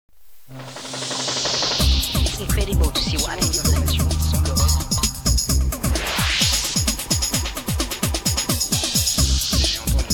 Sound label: jingle reality